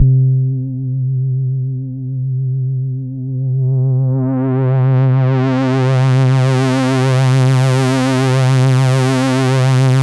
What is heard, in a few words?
C2, SynthLine; 90 s Sample CDs; NiceBeats; SynthLine VOL-21